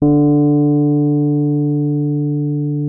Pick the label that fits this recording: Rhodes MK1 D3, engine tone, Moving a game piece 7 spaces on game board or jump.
Rhodes MK1 D3